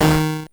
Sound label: multiplier D#4